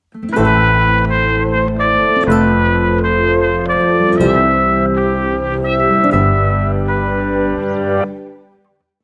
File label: ringtone trumpet